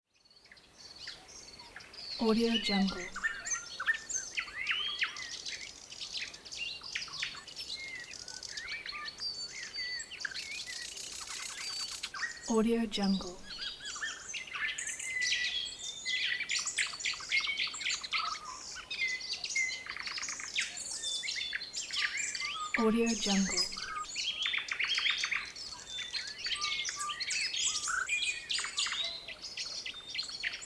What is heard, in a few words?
birds